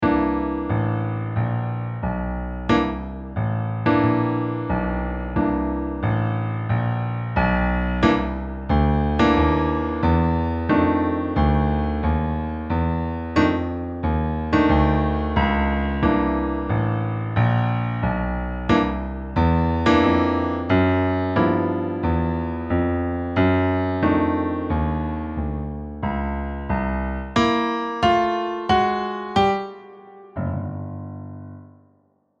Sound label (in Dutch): blues lopende bas